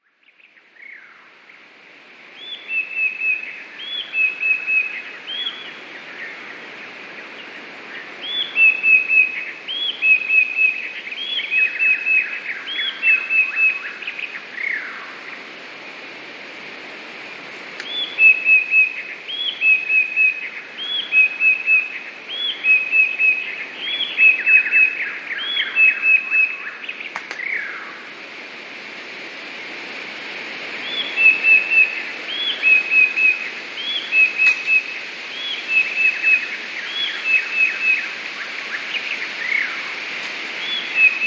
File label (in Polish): spiew; dwukrotnym zwolnieniu i obniżeniu o oktawę